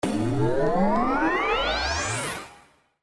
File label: Jackpot Anticipation; slots; Robot Vs Monster